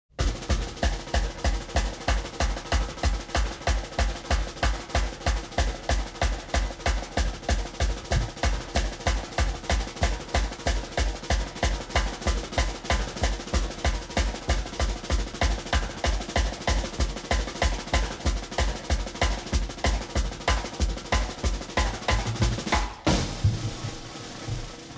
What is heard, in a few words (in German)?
Drums Percussion Schlagzeugsolo